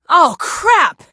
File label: chopper engine fire; Portal 2; Source Engine; video games; outland 08